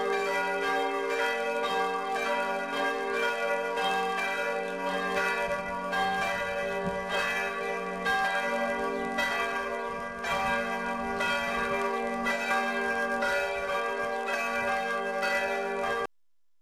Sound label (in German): Glocken